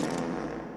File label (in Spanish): arma de pum